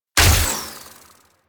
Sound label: Full Draw Shot, Weapons; Warframe; Infested; Derelict Cernos Prime